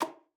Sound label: KLPA E4, ALTOSAX; Xsample VOL-2